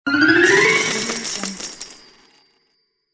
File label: game coin; umcsound